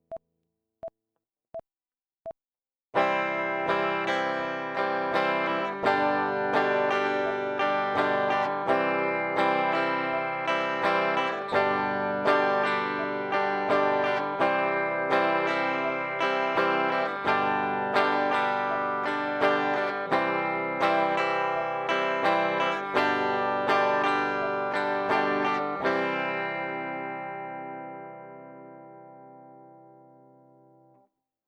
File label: Example 3 C to G